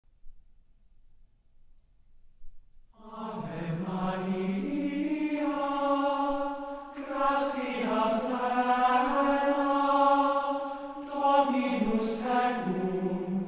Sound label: Ave Maria Chant; Spain 2013